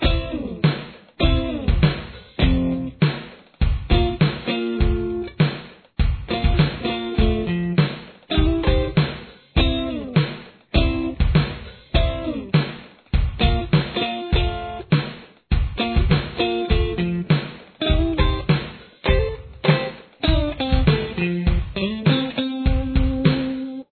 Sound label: verse guitar